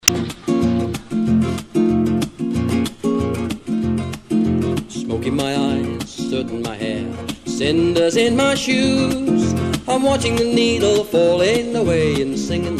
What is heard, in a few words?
blues